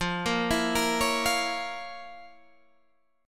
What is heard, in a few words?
F Major 7th Suspended 4th Sharp 5th Guitar Standard, chord; arpeggiated; 13,13,14,15,14,13; JGuitar